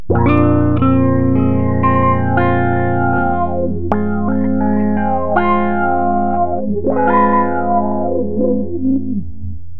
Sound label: env Sam Swirl